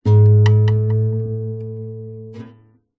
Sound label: musical guitar nylon tune string